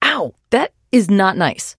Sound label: trader pain respond; 商人杰恩（七日杀）